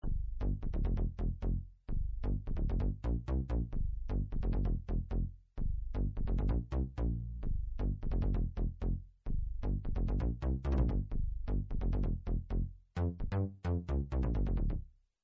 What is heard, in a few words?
digibass, yamaha sy99; synth